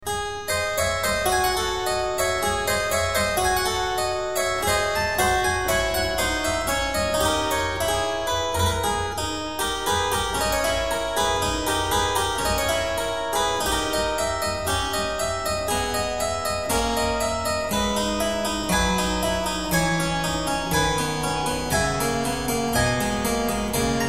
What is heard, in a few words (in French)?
sonate pour piano; SCARLATTI; K213-3